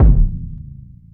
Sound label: KICK, Hip Hop; PlatinumPhattCD1; E-MU Producer Series Vol. 8 – Platinum Phatt